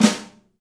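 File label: Pop Snare Flam; Snares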